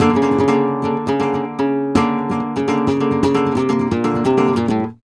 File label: Flamenco Guitar Remates De Pulgar, a